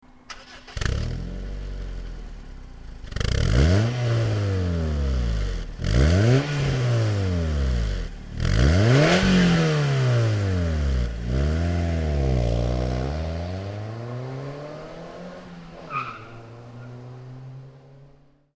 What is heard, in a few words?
magnaflow exhaust systems